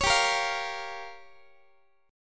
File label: G Minor 7th Flat 5th, Guitar; Gm7b5; strummed; x,x,16,17,14,16